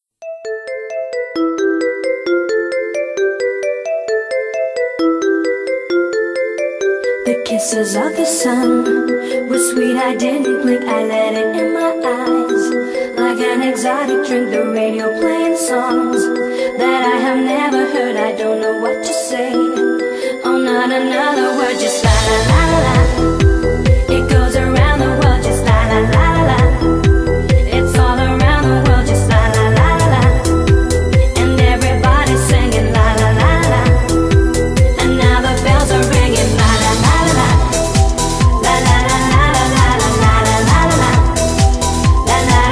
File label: music, jailbreak; niftymaps